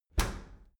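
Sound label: dry cleaner open soft, maschinen türen; tueren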